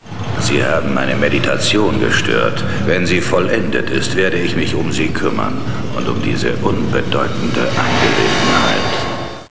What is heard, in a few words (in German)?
schatten meditation